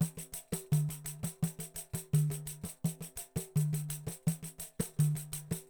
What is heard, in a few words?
Percussion works loops; Sampleheads; NYC Percussionworks